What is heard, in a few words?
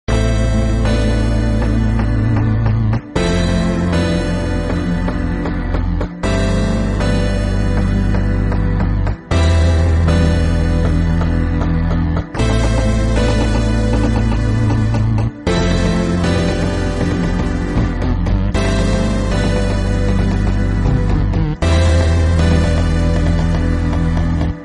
instrumental bgm; elden ring